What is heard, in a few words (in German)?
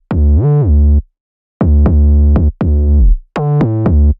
mit glide und oktavenspruengen